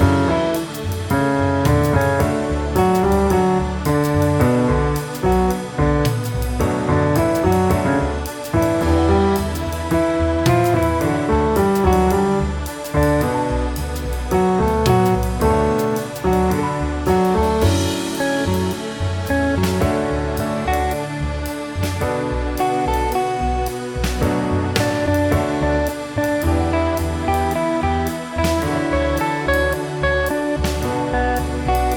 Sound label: Soulful Restaurant Loop; GMTK Jam 2023; These are prepared for Unity; 01. Soulful Restaurant is for the main menu scene